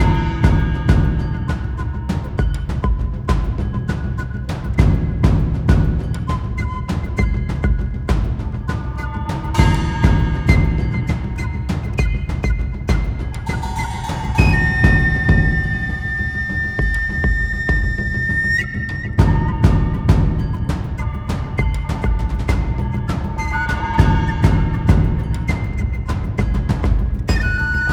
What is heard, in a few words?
Music (Boss Fight Intensity=Medium) Heavy; BossFight Phase=Phase2